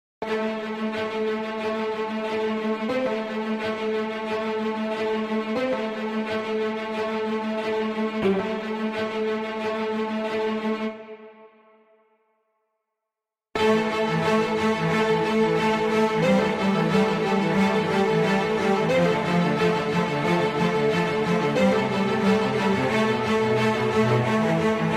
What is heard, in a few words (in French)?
power nation violons